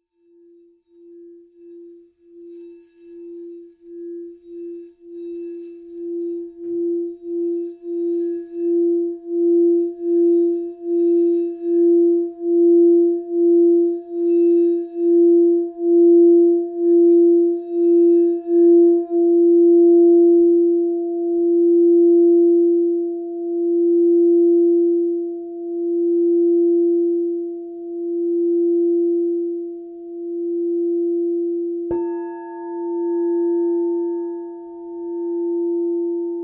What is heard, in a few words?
F Practitioner Bowl 6.5 Inch